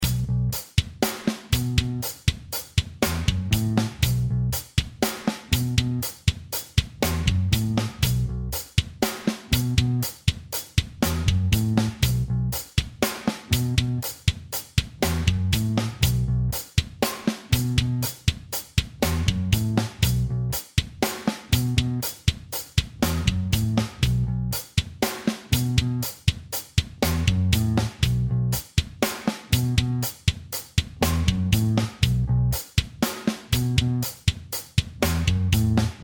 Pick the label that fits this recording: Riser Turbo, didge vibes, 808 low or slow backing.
slow backing